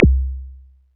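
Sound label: MISC Right, Techno 138 BPM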